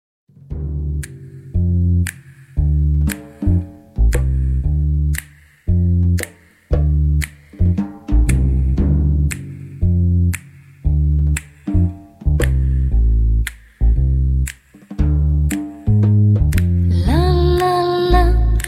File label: Slowfox; Dance: Slowfox